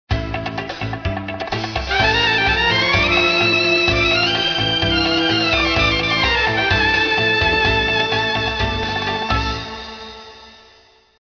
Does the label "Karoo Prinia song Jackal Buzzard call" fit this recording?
No